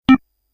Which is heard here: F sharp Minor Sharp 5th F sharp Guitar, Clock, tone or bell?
tone